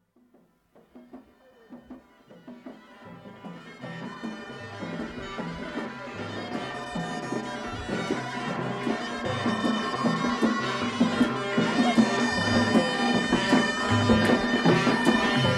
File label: Wedding Procession Marrakesh Morocco, Strings Flutes Trumpets; Music In The World Of Islam